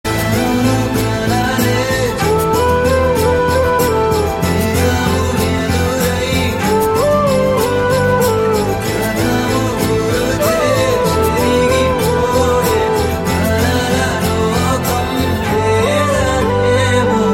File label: Flute Ringtone